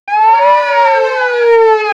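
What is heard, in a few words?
the purge siren drama, horror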